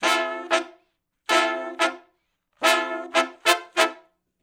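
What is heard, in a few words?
R&B Riff (Dmin, Fmaj) harmony; Sections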